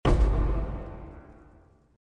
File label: Alert Sound